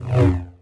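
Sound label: sith lightsaber Swing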